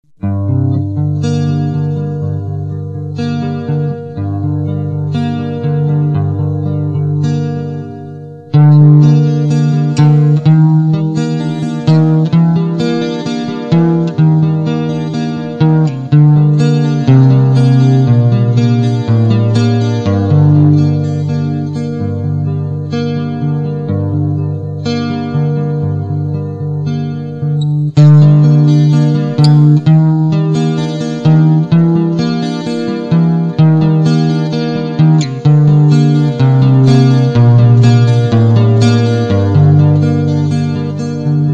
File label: acoustic intro